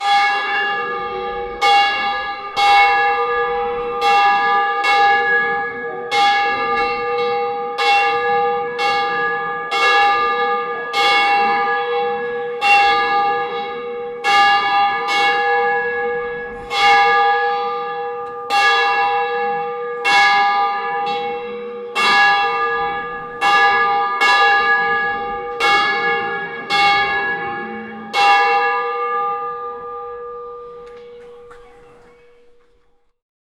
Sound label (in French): cloches qui sonnent